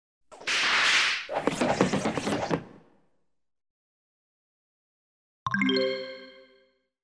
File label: Dart Trap; Mystara; TSR 2502 Hail The Heroes